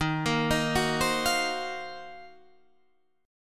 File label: Eb Minor 6th Add 9th Eb down Guitar Standard; arpeggiated